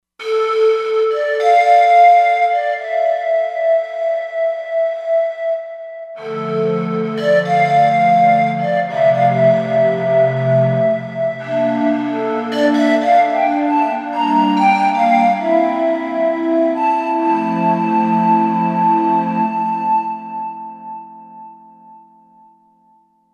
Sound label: Andes Mood, Roland Fantom XR